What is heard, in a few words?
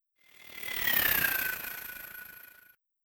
Spell Drone; Engineer Spell Drone1